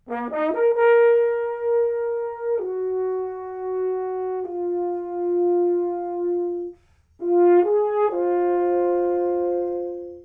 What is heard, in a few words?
french horns